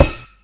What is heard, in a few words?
Metal Wood; opensim; CollisionSoundsAssetSet